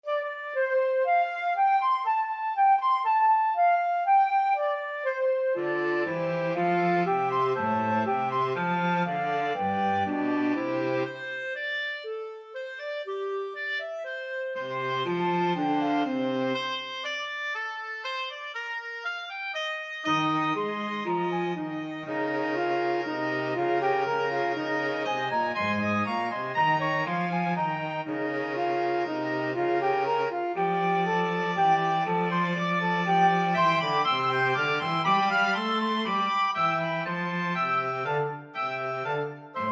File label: flexible mixed ensemble, 4 players